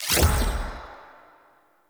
Decoy Bow Shot; Powers; Fey; Saboteur

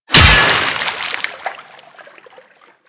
Axe Impact Water; Warframe; Weapons

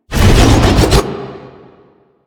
Spin Attack Start; Warframe; Veilbreaker; Sprag

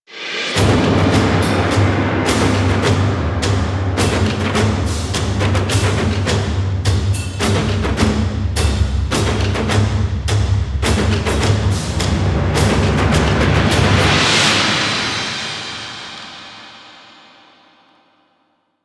Game Start, Music; Warframe; DE; PVP; Lunaro

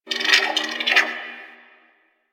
Creature, Ambience; One Offs; Creatures; Warframe; Quests; The New War; Drifter Camp

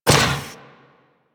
Moa Combined Step Default, Footsteps; Enemies; Warframe

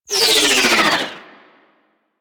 Ship Dual Cannon Tail; Crp Moa Dual Cannon

